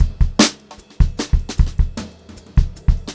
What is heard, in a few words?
Brushed Drum Loops; USB Soundscan vol.39; AKAI